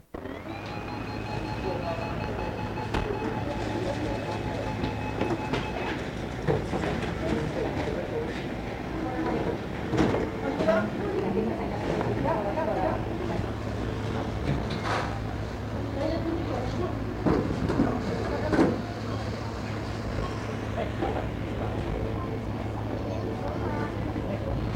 mcdonalds ambience

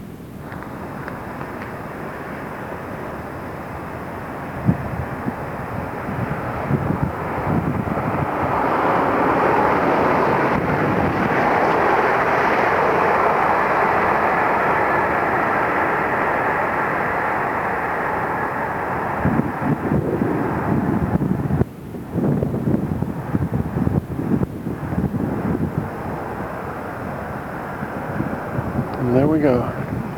train

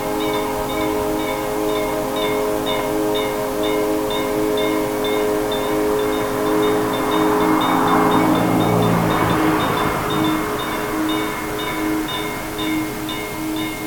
crossing warning

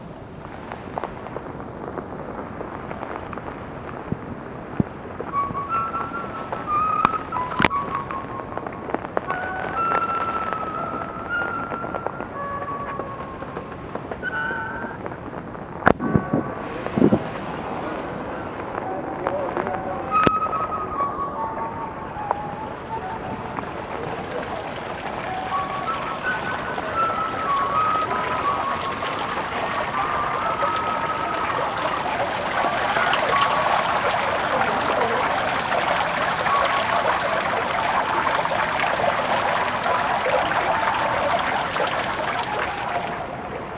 synth storm

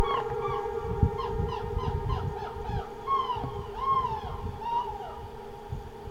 bird squeal

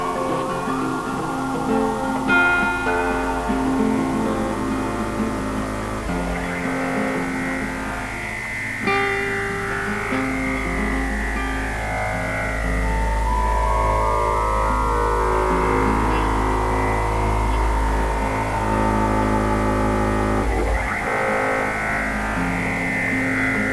cave guit; caveguit misty

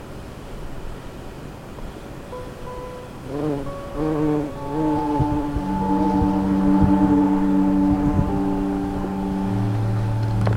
wasp

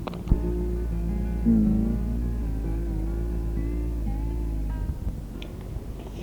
guitara

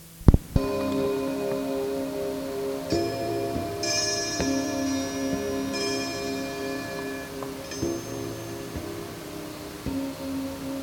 chimes

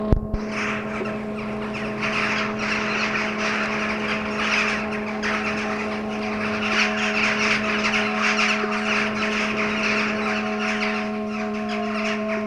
crows

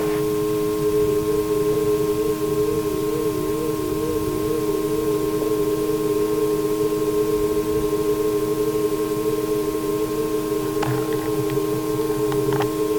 waves

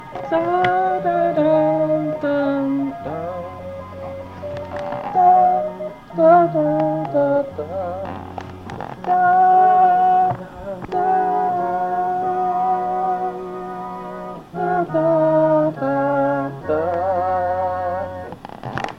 major scale; tape

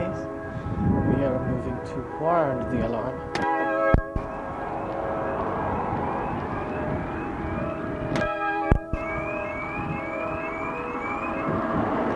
toward alarm

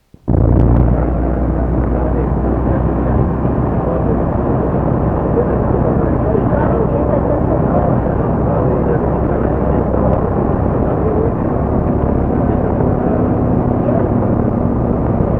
bus base

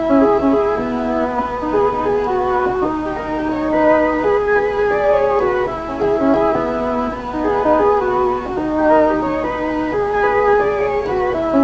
gameboy

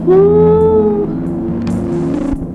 ooh

